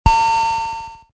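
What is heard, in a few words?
elevator beep; Soldier Of Fortune II Double Helix; XBOX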